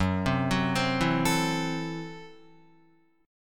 Gb Minor 7th Flat 5th, Guitar; arpeggiated; 2,3,4,5,4,4; 4E,4A,5D,5G,5C,6F; JGuitar

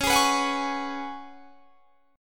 C sharp 7th Suspended 4th C sharp; C#7sus4; strummed

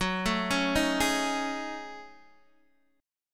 Gb Minor 6th Add 9th Gb down Guitar Open D

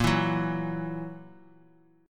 E Suspended 2nd Flat 5th A sharp

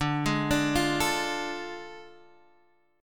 A Major 7th Suspended 4th Sharp 5th D

